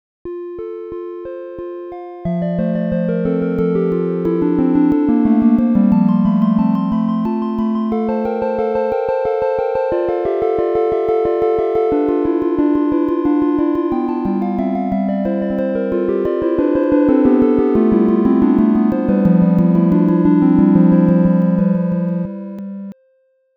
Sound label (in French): harpe